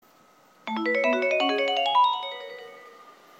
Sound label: keio pass up